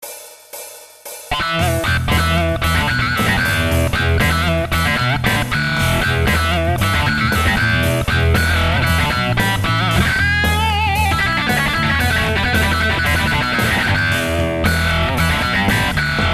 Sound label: Yamaha Egg Rock, Midi